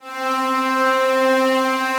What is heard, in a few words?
Broken String Selector, distressed strings; Instruments; Ableton; Mood Reel